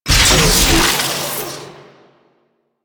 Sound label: Lightning Deactivate, Forest; Grineer; Warframe; Veilbreaker; Grineer Forest Thumper; Kahl